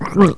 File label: gurp